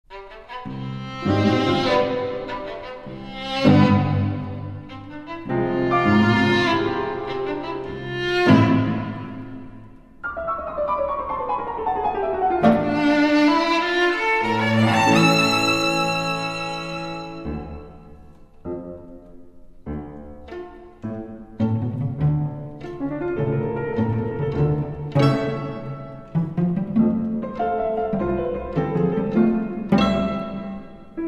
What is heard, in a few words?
Moderato Scherzoso For Piano Trio